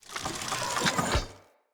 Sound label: Pollution Tower Face Close; Encounters